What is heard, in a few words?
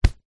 hand grab body wearing shirt